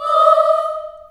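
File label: D#4 Choir Children Ohs, Choir Acoustic & Synth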